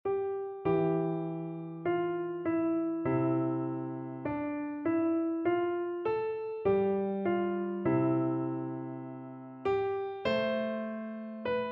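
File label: electric piano; it is well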